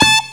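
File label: saxophone, BRASS KIT; Big Beat Samples & More; AKAI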